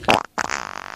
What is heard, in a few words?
fart bursting; Farts